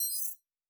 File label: Additional Weapon Sounds